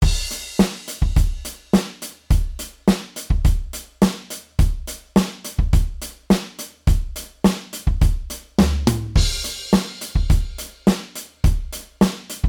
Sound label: modal surf, drums